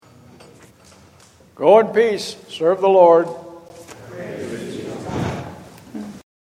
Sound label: Closing Response